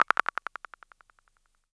Delayed click, Univox SR-95

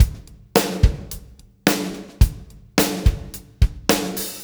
PULL, Backbeat, PULL UP; Spectrasonics

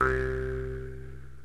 JEW'S HARP

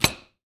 Knife Block, household rhythm samples Hits

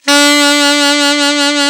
ALT SAX, D3; Best Service; Alpine Volksmusik

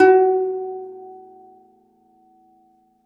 DOMRA, Segundo Domra, Plucked Strings

